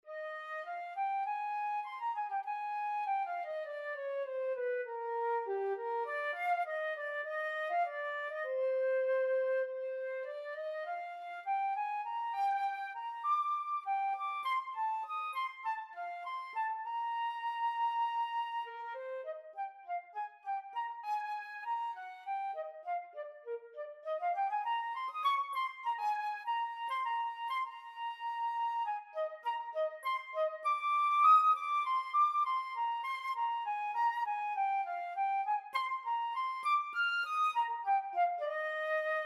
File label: Flute; Pensacola Civic Band WW Auditions